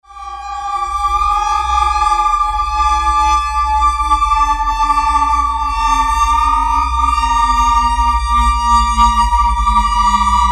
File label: Glass Tones